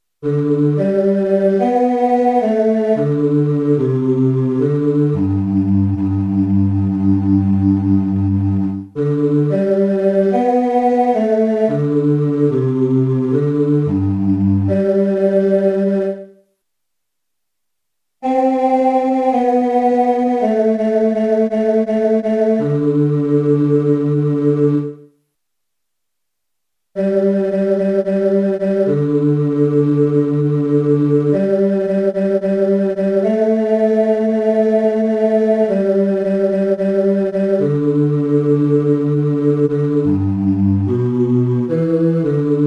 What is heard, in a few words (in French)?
basse